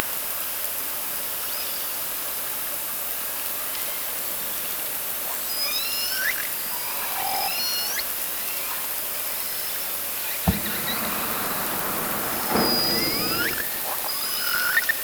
Orca sound Night Passby

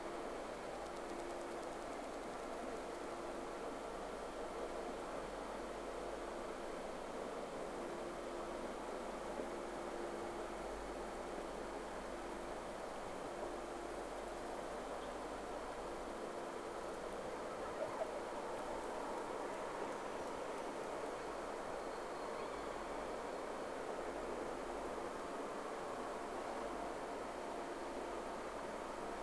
Whales; SeaSnake

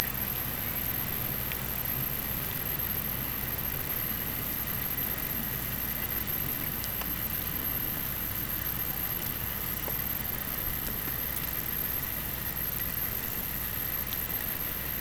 Hydrophones, orcasound

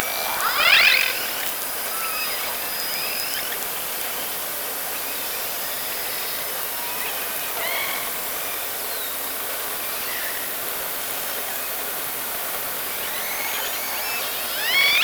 Orca sound Night Passby

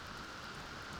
Background Noise

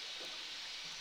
Background Noise Clips

